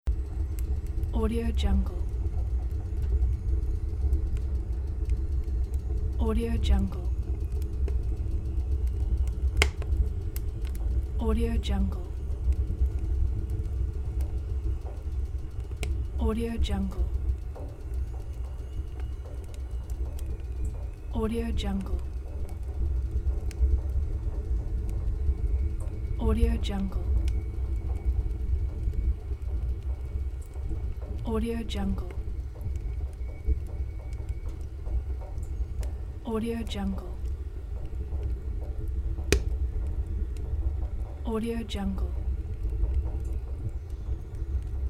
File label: fire burning in a stove close loop